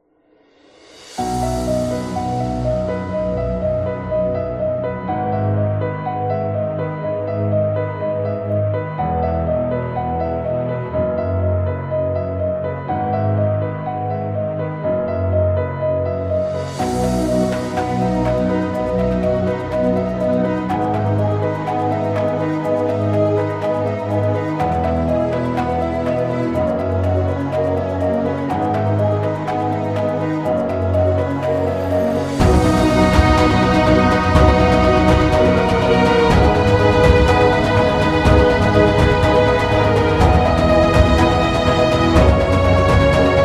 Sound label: Epic Inspiration, epic music; epic music vol 1